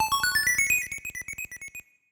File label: Scene Clear; Teenage Mutant Ninja Turtles II The Arcade Game